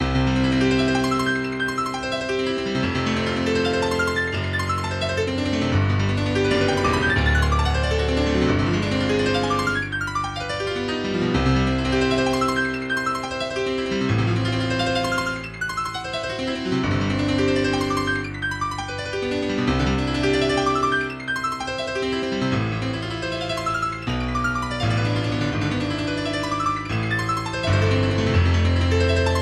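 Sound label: Etude No 1 in C Major Allegro, MIDI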